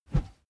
whoosh bamboo slow; swooshes-swishes

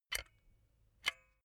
silicone gun bullet lock mechanism soft; soundpool

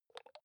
hygiene small cup open soft; haus-garten; badezimmer

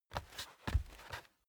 step barefoot wood plank scuff; Footsteps; schritte

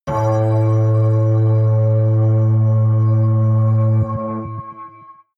metal organ, hits impacts

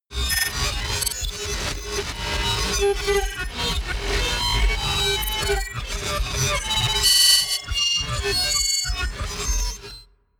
promo pad; atmosphaeren umwelt

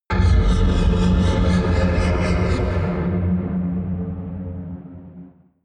flanger flutter high; whooshes rises; hits impacts

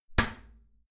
toilet lid close regular; haus-garten; badezimmer

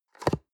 box small close hard; industrie wirtschaft; bueroarbeit

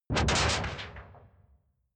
sci-fi alien wobble slow; swooshes-swishes

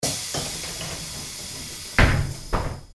bus door closing

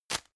tissue grab hard; objekte; taschentuch

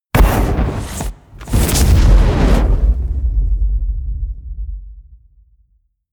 flame, explosionen; comedy-cartoon; soundpool

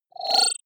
disappear flicker short, signale; soundpool

